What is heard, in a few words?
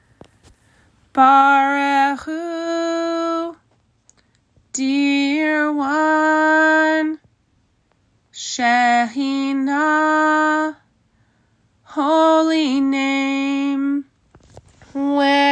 Barchu